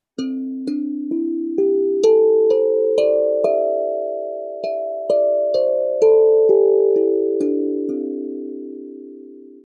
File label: Hapi Drum; Perc-melodisch